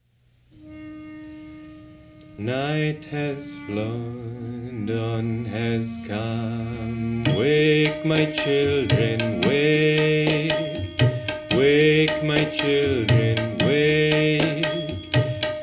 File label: Dawn Chant